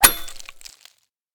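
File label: pickaxe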